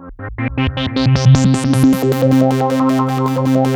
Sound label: Korg, FX